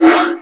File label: breadmonster idle; tf2pets